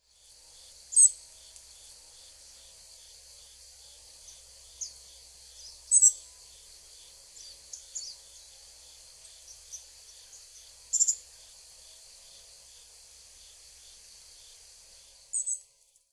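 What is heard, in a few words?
Grey-streaked Flycatcher; ezobitaki; hyogo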